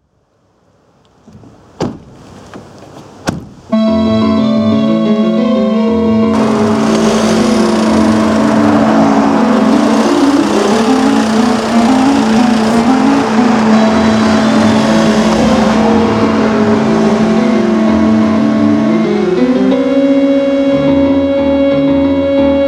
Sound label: Sub drive away